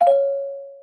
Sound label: plinko pos